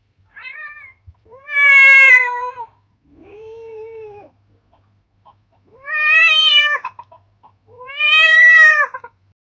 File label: cat evil laughing